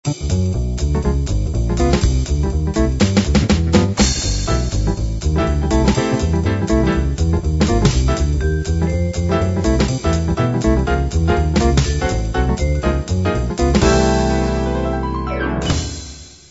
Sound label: bop